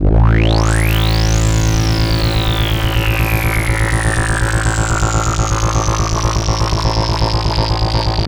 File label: Roland C A1, Roland S100; musicradar-synth-samples